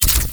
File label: elec spark laser